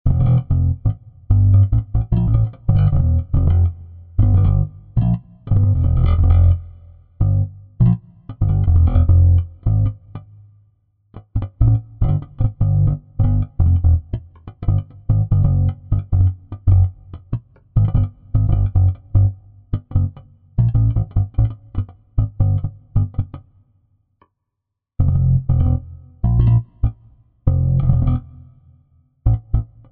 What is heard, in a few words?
bass to midi synth; gtrsnipe